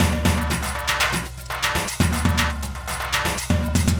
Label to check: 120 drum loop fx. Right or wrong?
Right